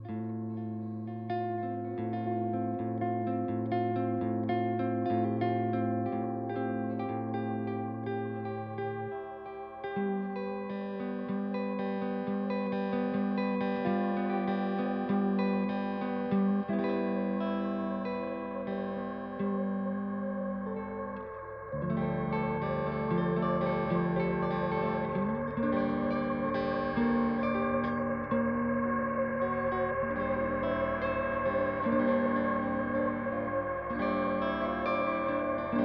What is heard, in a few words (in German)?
Lethargy Gitarre; Dreadbox